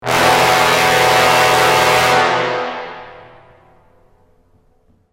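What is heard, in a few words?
Sting Large Brass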